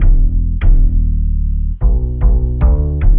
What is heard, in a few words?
150 moody chorused upright, BASS LOOPS